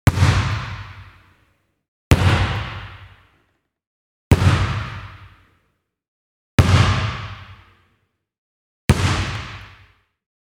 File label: bounce